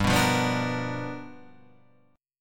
G 7th Sharp 9th G chord, Guitar Open F; G7#9 strummed; JGuitar

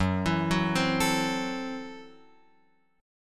Gb Minor 7th Flat 5th Gb Guitar Open F; Gbm7b5 arpeggiated

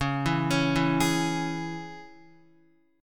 Db Minor, Guitar; Dbm arpeggiated; JGuitar

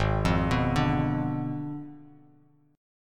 Ab Diminished 7th Ab, Bass Standard; Abdim7 arpeggiated; JGuitar

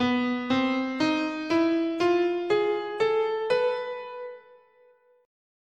B Oriental, scale

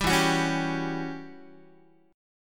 E Minor 6th Add 9th guitar chord; Em6add9 strummed; JGuitar

